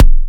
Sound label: deep, Kicks Electro